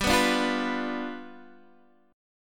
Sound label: G 7th G down Guitar Open D